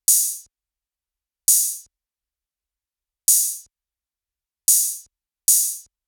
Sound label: Future Bass Kit 150bpm Open Hat; Drums; EDM Drums; Drum Kits; Stems; Ghosthack; Ultimate EDM Drums; Drum Mega Bundle; Future Bass Kit 17